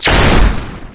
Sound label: Laser; Nickelodeon Super Brawl Universe; Android